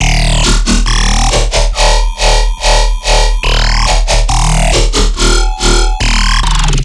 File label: Riddim Bass Loop - D# 140; Killbot; Ghosthack; Riddim Essentials Volume 2